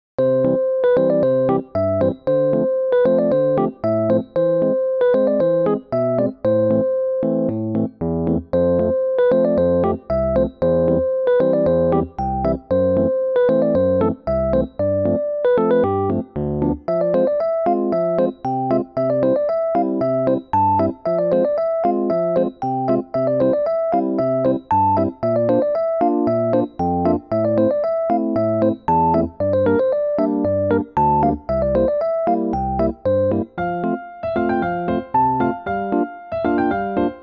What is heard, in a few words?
Newage